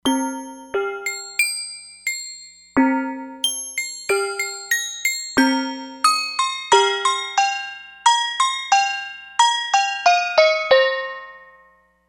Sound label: Sake Bell; Factory patches